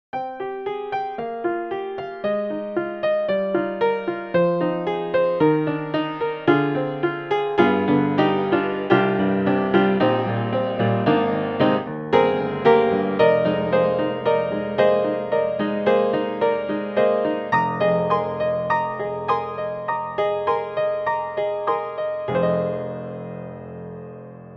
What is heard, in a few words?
Upright Piano Upright Modern; Native Instruments; Upright Modern Playtime